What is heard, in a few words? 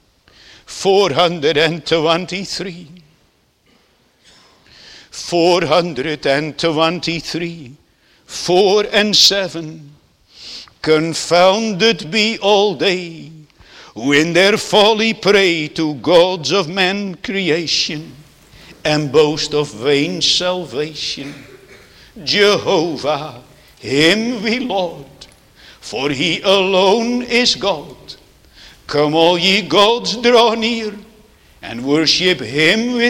Singing